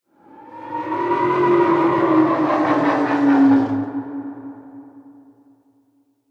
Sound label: Ghostly Howl, Horror, Ghostly, Howl, Cackle, Eerie; Ghosts